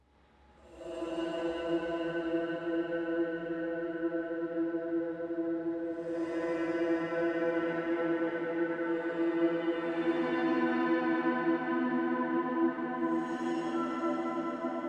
Temple Ambient; modern combat 5 blackout; android

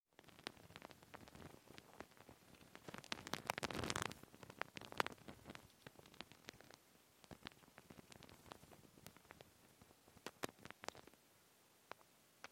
Cable; Cherricar Cable

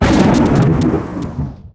Pulse Grenade

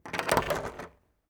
SFX Door Wooden Open; this is the zodiac speaking 2020 gamerip switch ps4 xbox one pc macos

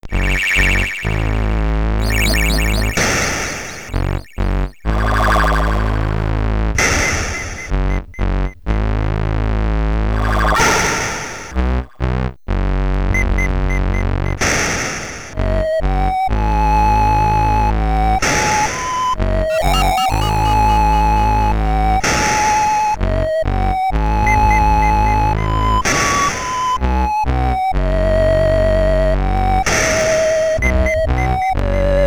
BGM, SID Stereo, Commodore 64; Fist II The Legend Continues

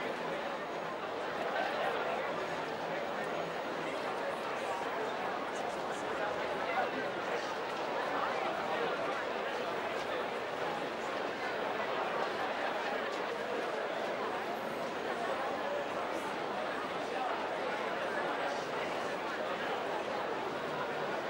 Ambience - crowd medium; gamerip; sim city societies

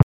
Kick Ball; snes; John Madden Football

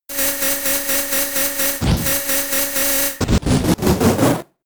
Defend Order; attack moves; Pokemon; Gen 4; DPPL HG SS

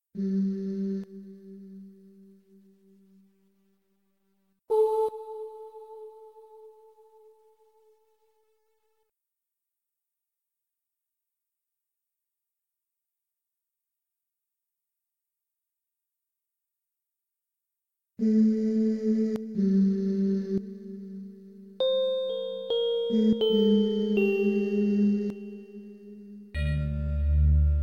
Ambiance

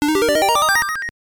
Jingle; Urusei Yatsura Miss Tomobiki wo Chigase; Game Boy

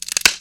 Wood break

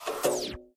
Propeller hat Space Off

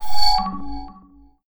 no moves

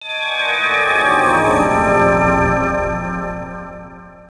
Gameover (Game Over); Blazing Star; arcade

